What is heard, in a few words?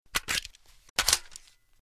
reload, weapons sterling; garrysmod